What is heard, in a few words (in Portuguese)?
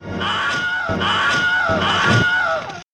grito grito